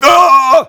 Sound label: "oooh"